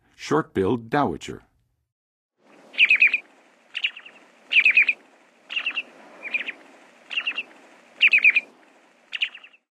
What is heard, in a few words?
Short-billed Dowitcher, Birds of North America; Western USA; Western USA Songbirds